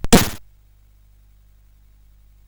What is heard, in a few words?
Tecnetium, CSG8580R5